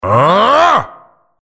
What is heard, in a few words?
coal throw